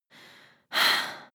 breath female mouth in out

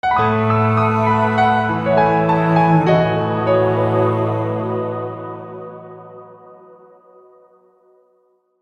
gentle piano logo

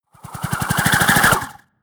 whoosh flutter

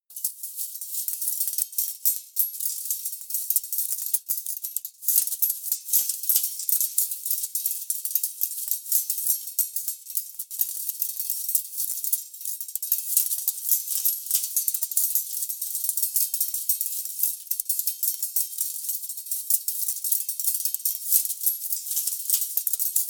atmosphere clicky clicky

